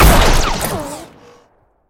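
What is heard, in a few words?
ricochet of a bullet after an inaccurate shot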